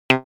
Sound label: synthlead; pulsar